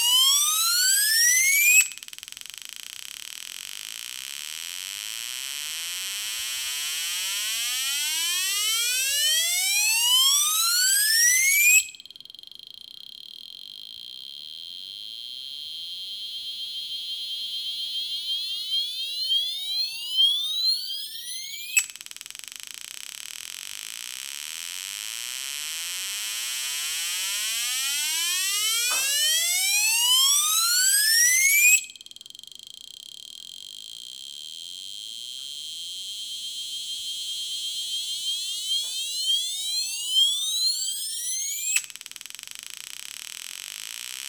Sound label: piezos sweeps paper